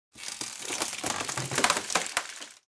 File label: Wood under pressure before snapping